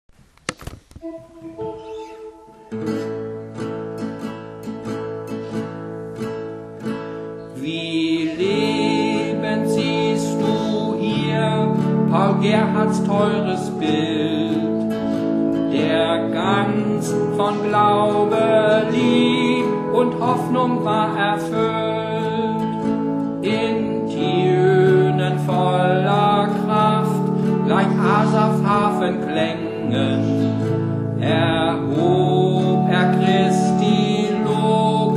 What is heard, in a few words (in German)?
Orgel